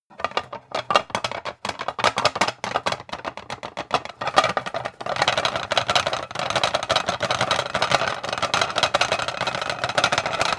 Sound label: pan rattle long